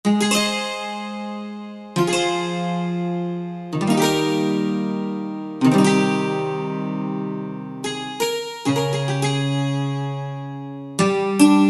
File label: Bouzouki, Korg TR-Rack